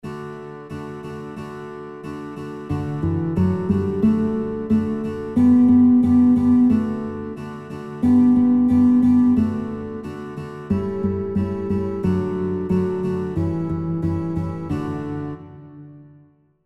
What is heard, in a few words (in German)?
Guitar Alle Meine Entchen; Guitar Songbook; kinderlieder gitarre playalongs